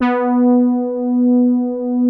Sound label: soft brass, poly mid H3; Music Store - Claudius Diemeter Schwerlos